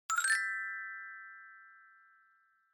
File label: Glocken Low Short